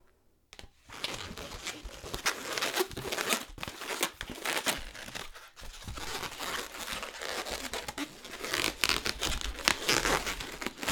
Making A Balloon Poodle

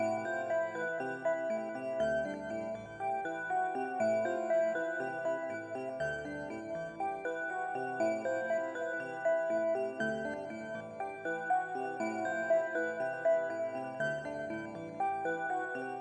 Bell Sequence

